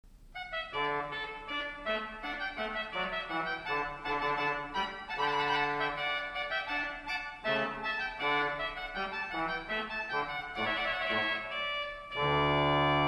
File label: Organ Swell Trumpet